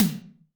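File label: Snr Hi Wet Lct, snare; Alesis D4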